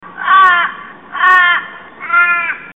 raven

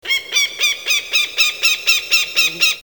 audio of hawk

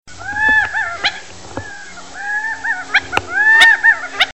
quail call; upland birds

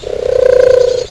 wood pig

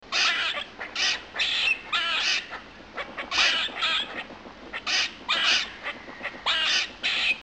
Squirrel Distress

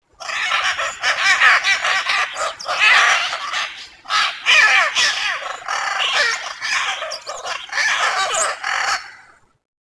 Nature Scarlet Macaw

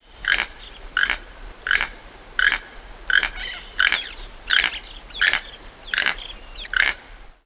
Nature Keel-billed Toucan

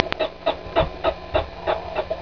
prairie dog sound

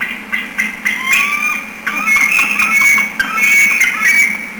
bald, hawks eagles

